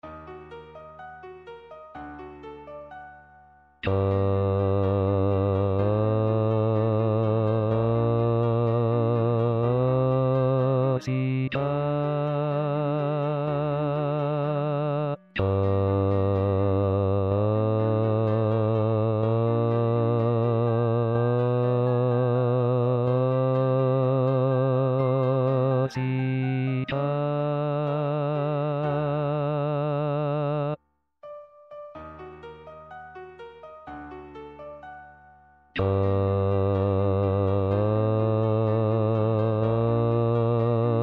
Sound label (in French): corsica basse